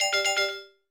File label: Evolution (Jingle)